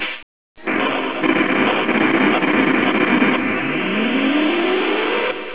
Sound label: mega bomb